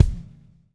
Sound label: SLICK, Backbeat